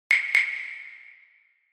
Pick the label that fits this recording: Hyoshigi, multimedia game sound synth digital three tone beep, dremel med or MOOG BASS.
Hyoshigi